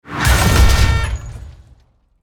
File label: Car crash impact